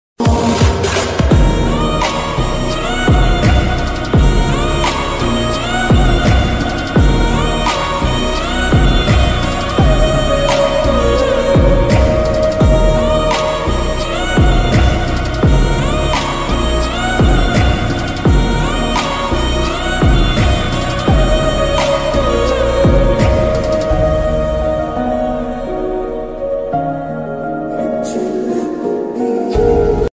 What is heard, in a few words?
bgm ringtone; Chellakuttiya movie; south movie